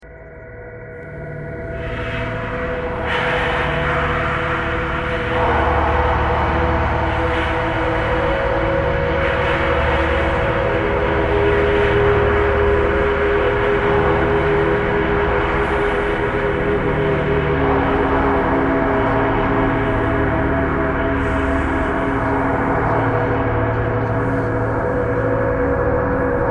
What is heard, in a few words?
traffic core birds thread simple